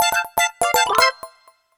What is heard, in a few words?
unused jingle; Sm3dl; mario